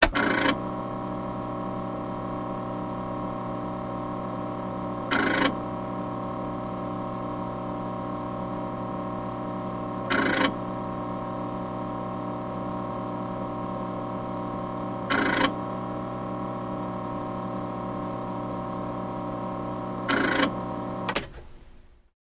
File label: Computer Switch On; beeps & tones